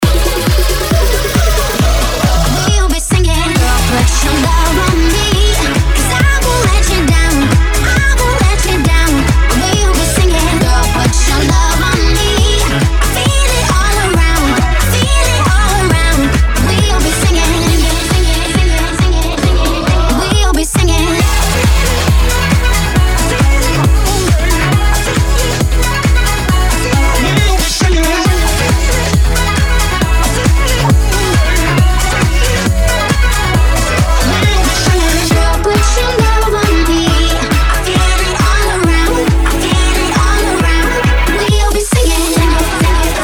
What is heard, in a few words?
disco; Duo disco children